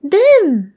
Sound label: boom